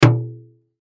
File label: Impact Metal Dull Hit; Impact Metal Dull Hit Ring; Materials; Blastwave